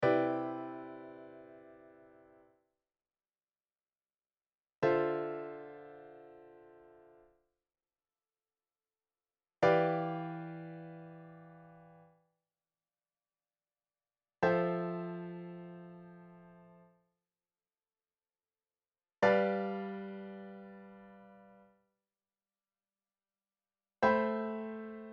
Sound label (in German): nonenakkorde; harmonie